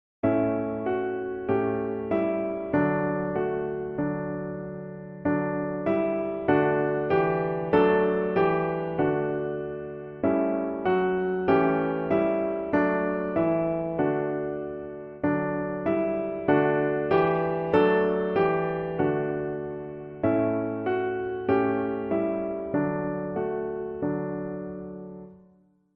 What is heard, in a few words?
Accompaniment Track